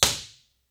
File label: IR